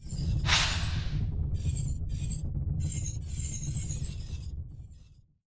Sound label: In Flight Whip Crack